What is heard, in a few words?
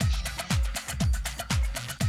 120, Classic Drum Loops